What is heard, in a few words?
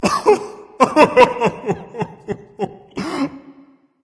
boohoo berserker; cuchulainn